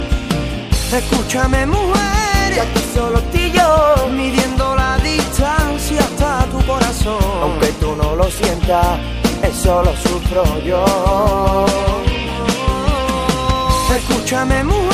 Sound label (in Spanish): fondo flamenco, ringtone